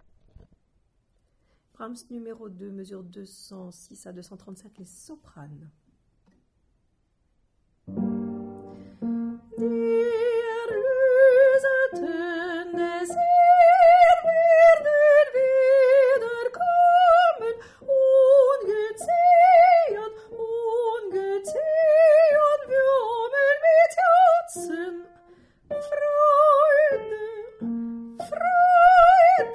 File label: Soprano